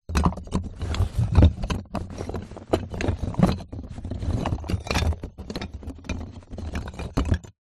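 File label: plaster pieces in cardboard box rummage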